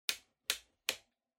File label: Electric Fan Angle